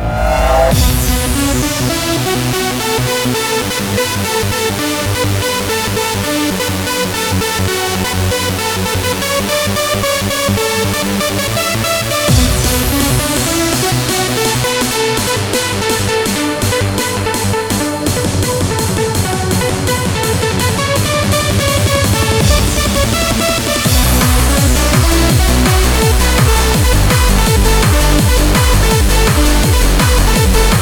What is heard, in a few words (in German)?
mukke rechts; streetclimb